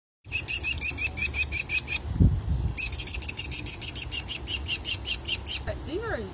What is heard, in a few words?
Fledgling American Kestrel